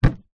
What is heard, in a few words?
household cool box plastic lid close